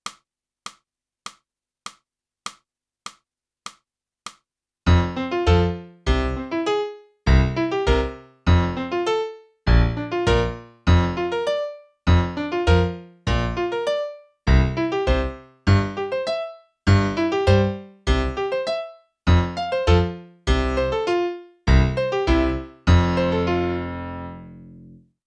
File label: clarinet; boosey method